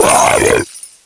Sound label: Deer Attack